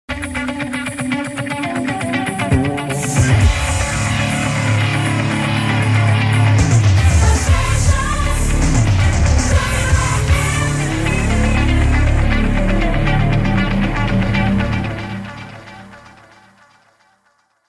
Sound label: Jingle, radio; The specials